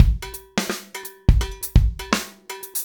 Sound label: 084 HipHop Live Drums Bell; Digidesign; Pro Tools; Pro Tools Essential Loops